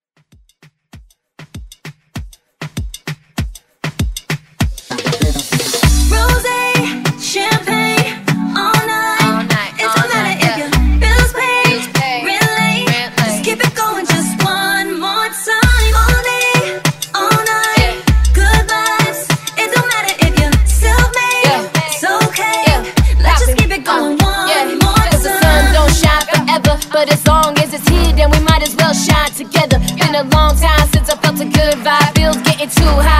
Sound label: Moombah Remix